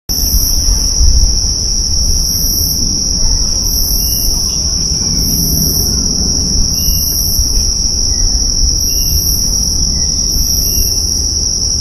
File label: Icterus croconotus; Ribera Norte; Jorge La Grotteria